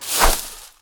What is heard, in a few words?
Broom Attack